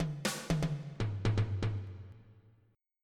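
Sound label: chacha, break; Sounds Terrific II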